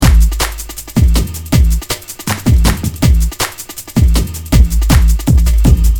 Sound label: drum loop